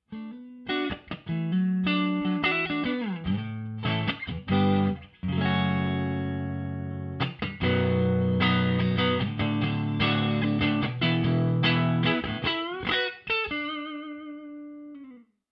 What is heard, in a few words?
clean